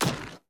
npc tree golem little projectile

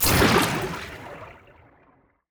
ui player spell water throw

